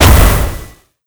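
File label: boss explosion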